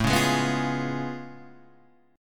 A Augmented Major 9th A down Guitar Half Step Down